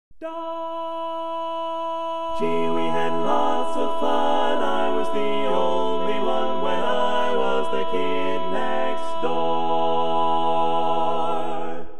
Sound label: Bass, barbershop